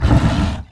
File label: walk, fire tiger; fire tiger boss